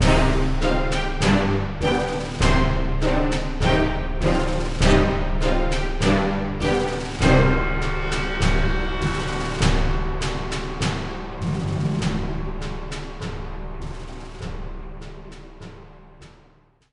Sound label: Defeat With Fade Out